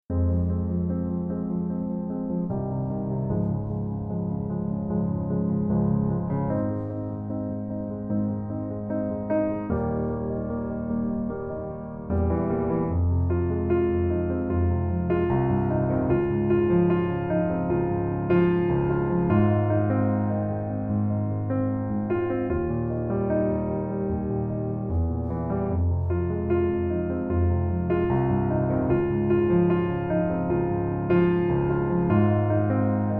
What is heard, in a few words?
Piano